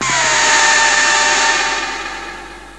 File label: scary